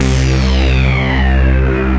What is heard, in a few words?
Synthesizer Middle C Notes, Lead